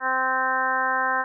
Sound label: trumpet add